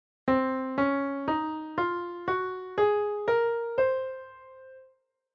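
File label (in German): Tonleiter C Hypophrygisch; Weitere Skalen; Tonarten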